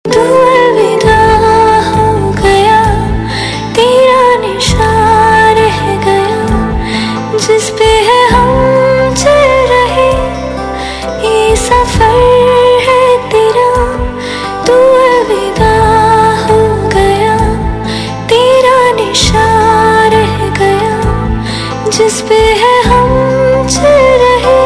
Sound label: Female Ringtone; Bollywood; Traffic